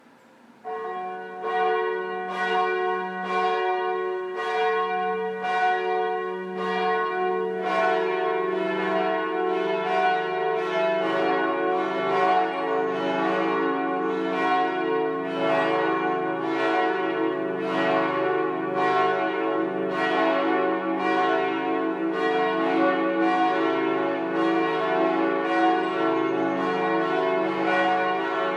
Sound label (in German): glockengelaeut; herz-jesu